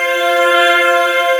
4THS, SYNTHESIZER; AKAI S6000 CD-ROM - Volume 1

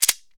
SHEKE, Afro Shakers, Shekeres; Roland LCDP14 Africa VOL-2

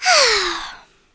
Mouth Fx, Vocal

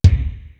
WET KICK; PROC.BDS; East West; Global Sounds

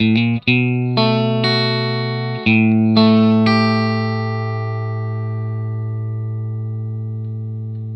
TURN 1 E, Vintage Blues Guitar, 120; USB Soundscan vol.22; AKAI

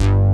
MGLOW BS; Pro-Rec - Synthsonic

